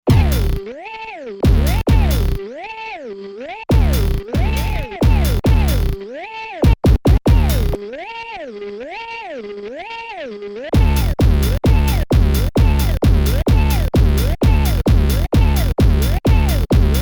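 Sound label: Drum Jungle Call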